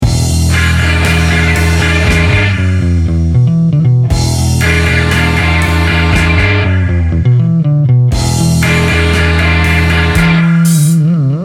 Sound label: sound test